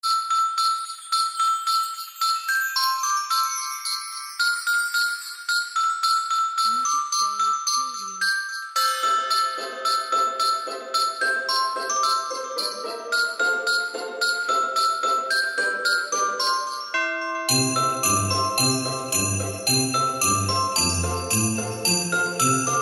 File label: Jingle Bells